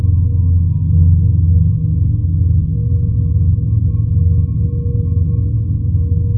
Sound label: drone eerie